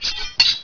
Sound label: sickle start; dusk